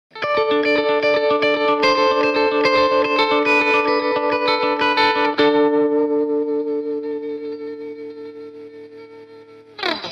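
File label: Electric Guitar Rock Arpeggio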